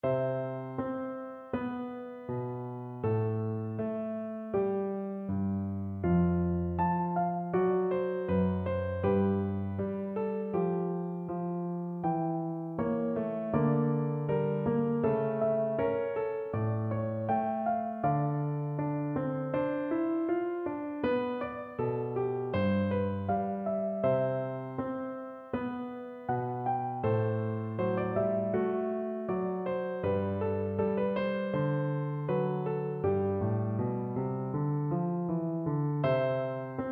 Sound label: bach aria, piano duet